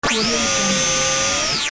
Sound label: radio control frequency malfunction; sound ideas